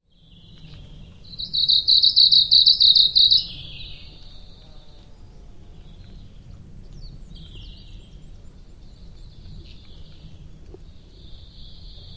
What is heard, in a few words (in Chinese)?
短尾鶯, Urosphena squameiceps; 日本; 連續、急促、極似蟲鳴的高頻聲音「急急急急急急急急!」，通常最後會有「嘎然停止」的感覺; 三月較容易聽聞。這種聲音大多從中上層發出。; Wild Bird Songs in Japan